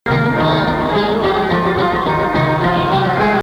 Rast Ya Shadi Rast; muwashshah in Rast

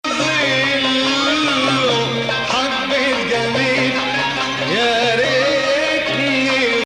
Bayati Ah Ya Hilu Nahawand; As above, feeling of Husseini 5 and Ajam 3; Nahawand 4

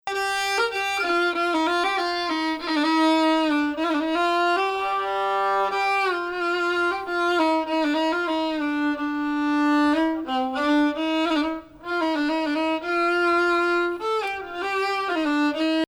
Bayati Samai Bayati; Samai Bayati il-3ariyan; Bayati 1

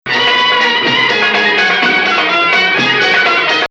Bayati Bayati, descent from 6; Bayati 1

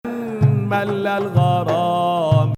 2nd Ajam

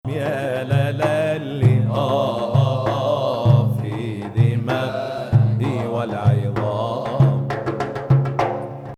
Ajam; Ajam, which resembles the Western Major scale, has two distinct versions

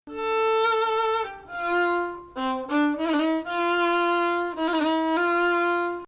Bayati Ajam 3, Maqam Bayati; including notes under tonic; could also parse as Bayati

